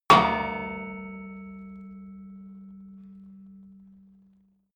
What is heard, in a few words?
metal impact girder long